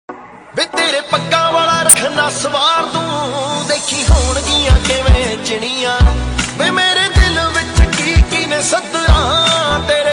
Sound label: punjabi ringtone punjab song ringtone